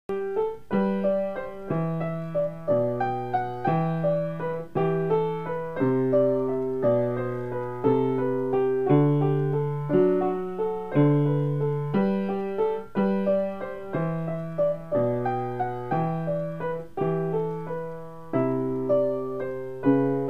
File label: piano